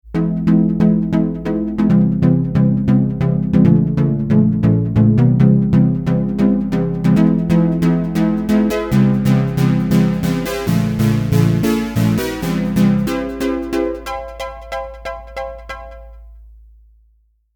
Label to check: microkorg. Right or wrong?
Right